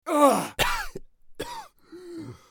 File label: bear death; eft